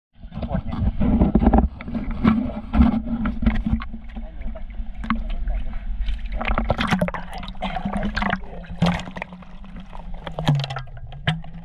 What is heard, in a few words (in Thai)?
เสียงบรรยากาศ; รร-อ่าวนาง; ท่าปอม